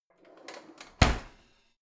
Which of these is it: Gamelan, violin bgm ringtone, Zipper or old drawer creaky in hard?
old drawer creaky in hard